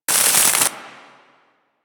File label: Ice Missile Loop; Warframe; Grineer Hammer Boss; Abilities